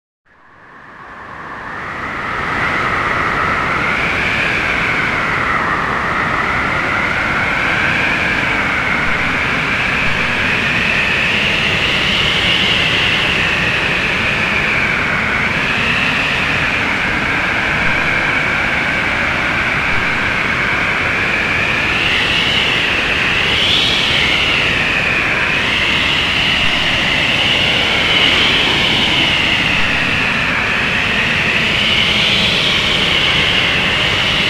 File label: Windy Snow Storm